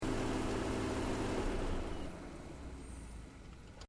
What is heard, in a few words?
Car Nissan 350Z Engine Bay Shutdown, manual sportscar; Vehicles; Cars